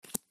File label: card flip; new-years